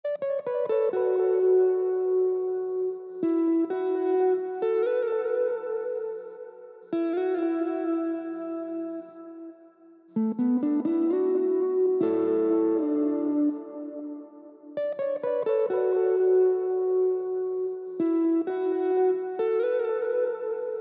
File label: 130 BPM D Maj Lead, Guitar; Melodies; Loop Stems; Cymatics; GHOST (Beta Pack)